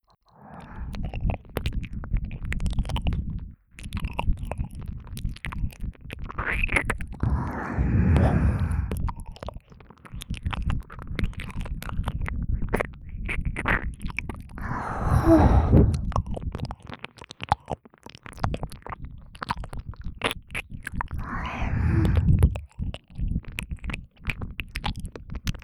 右耳舐めループ; ASMR; ぐちゅぐちゅ耳舐めver

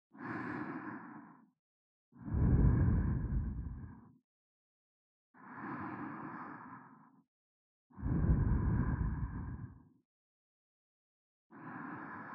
寝息ループ; ミアシア寝息ループ; ASMR

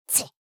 ちっ, ASMR, 相づち・反応系【ネガティブ】

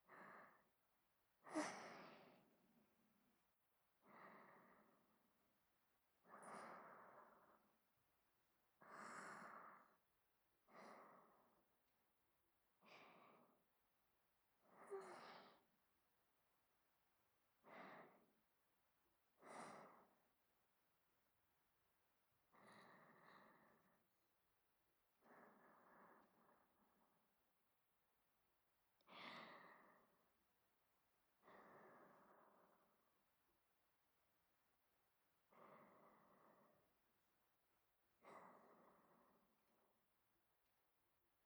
寝息ループ（ループトラック）, ASMR; 寝息ループ

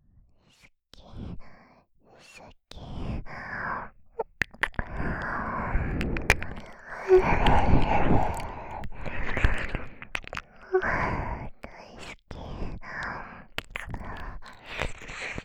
すきすき密着囁き耳舐め; ASMR

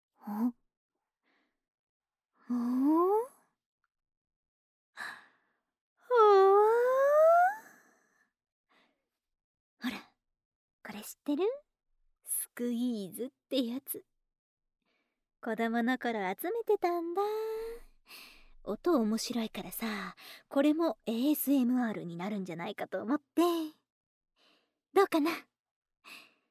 スクイーズASMR

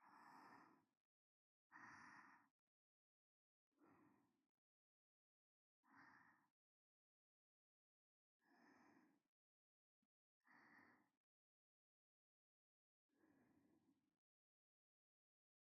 添い寝ループ

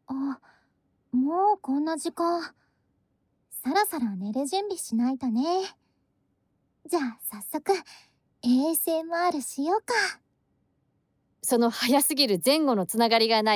『トリプル擬音ASMR』

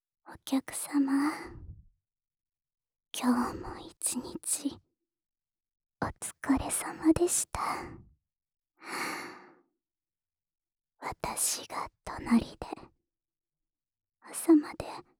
添い寝, ASMR; 六花 左

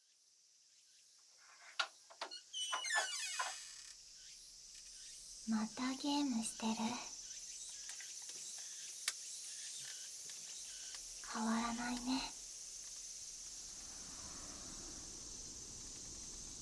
耳舐めとく（BGMなし）, ASMR

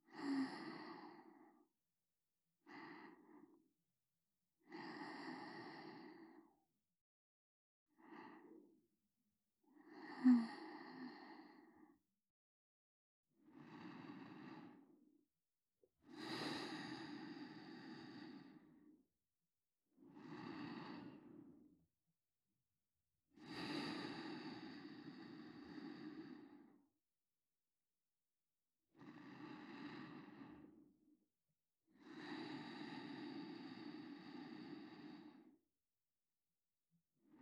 寝息 反転

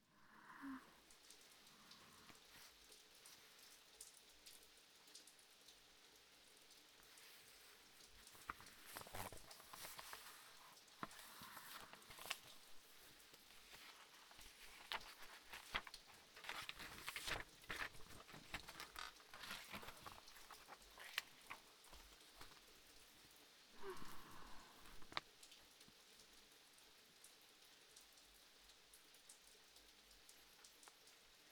居眠りする書き物作業＆雨の音 セリフなし

